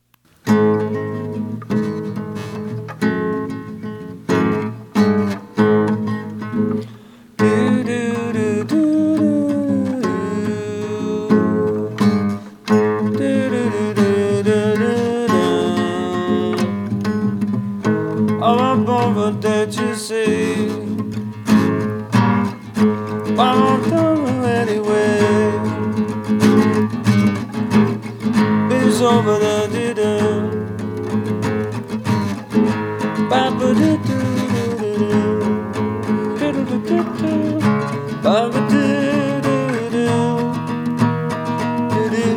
Acoustic

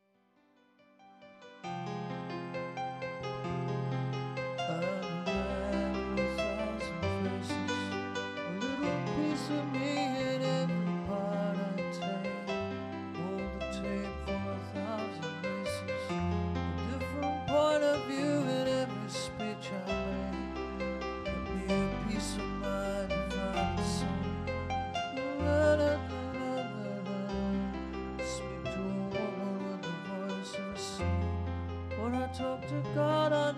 Piano Intro

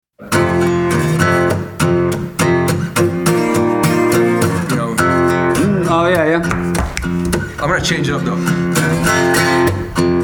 Jams

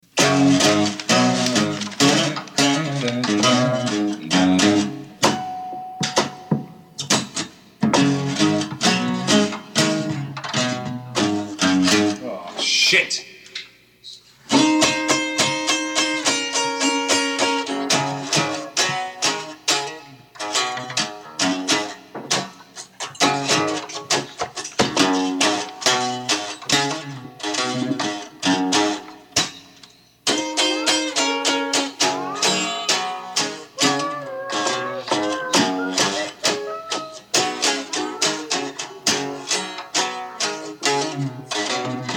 Blues, Acoustic

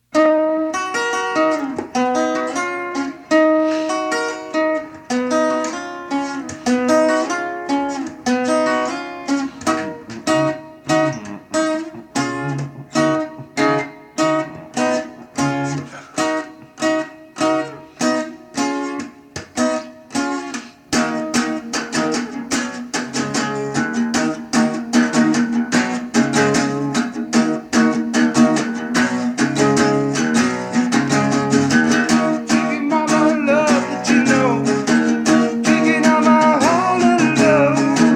Acoustic

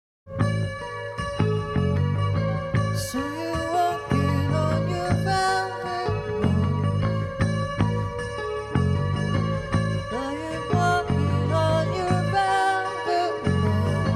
guitar

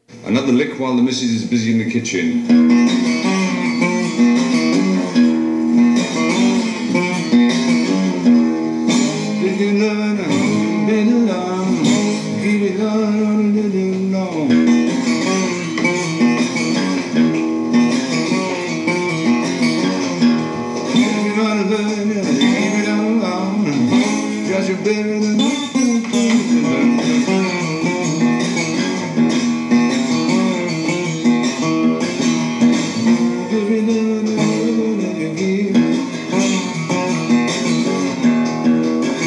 Acoustic

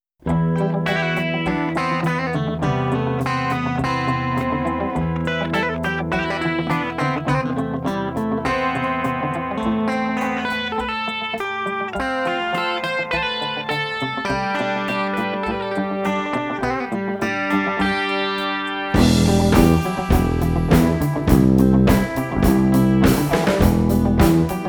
Instrumental

